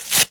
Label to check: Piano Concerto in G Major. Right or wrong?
Wrong